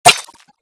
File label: impact; slender; dbd